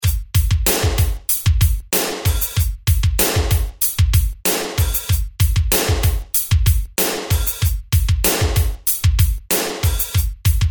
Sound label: Hip Hop, 95